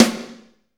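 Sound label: SNR, snare, Drumscapes Roland; Northstar; Fast Shuff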